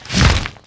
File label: bird flap